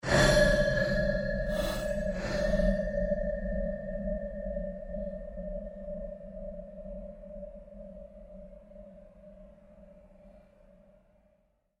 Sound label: Created Pulse Fade Long, Rise And Dive Sound Effects; sound building blocks created through manipulation of raw material